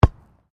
SOCCER BALL KICK FROM HANDS; Soccer ball sounds; Sports

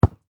Sports Soccer Ball Land On Grass

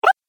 Jump Video Game

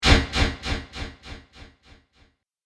Multimedia Swipe Echo, transition; Computing Noise; Andrew Fleming

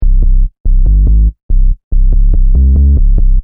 Techno Trance Sub Bass Loop In C 142 Bpm

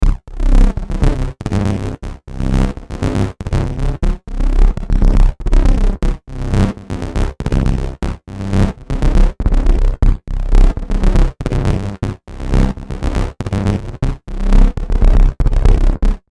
Electrical Crackle Stutter; Blastwave

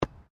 RUGBY BALL HANDLE; Various rugby sounds; Miscellaneous Sports (Sound Effects:Sports)

